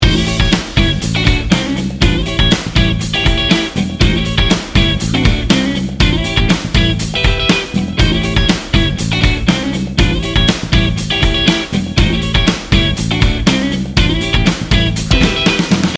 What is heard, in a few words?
funky and fun loop